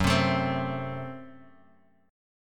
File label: F sharp Diminished F sharp; F#dim Chord {2 3 X 2 1 X} strummed